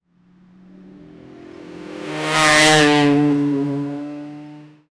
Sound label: fast heavy old motorbike; motorcycle